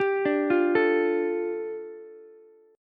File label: A 7th Suspended 4th Sharp 5th, A, down, Ukulele; A7sus4#5 arpeggiated